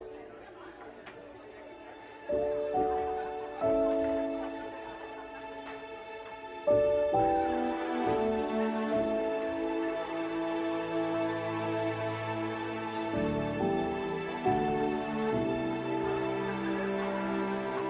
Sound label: Instrumental